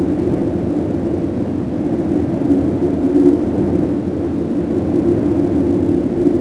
cave; WhiteOut; XBOX; crater

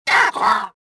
pushed, rodian

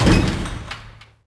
Garage Door Opens; Max Payne

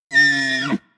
Elk Vocal; Animals; XBOX; BassProShopsTrophyHunter2007

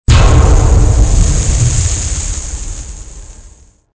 door; Soldier Of Fortune II Double Helix; XBOX

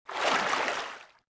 water wade, footsteps

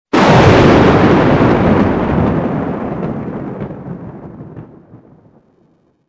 thunder loud; Soldier Of Fortune II Double Helix; XBOX; ambience

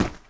bodyfall, foley

